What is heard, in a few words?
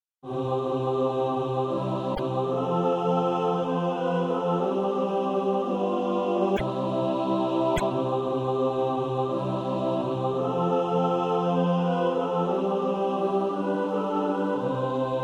i gave my life for thee mixed